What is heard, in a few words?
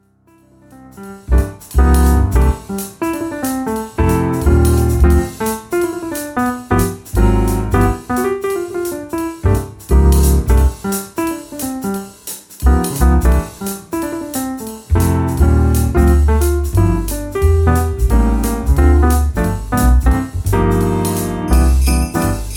Jingle Bells; ComposeCreate